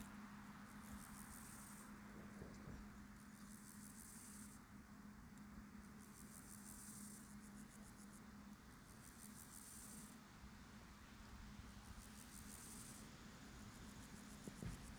dorsatus

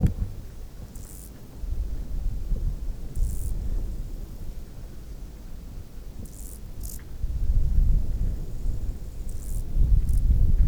Chorthippus albomarginatus

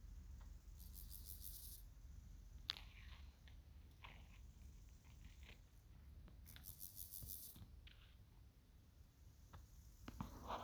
dorsatus

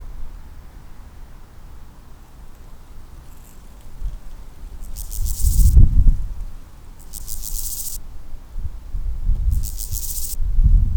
Chorthippus dorsatus

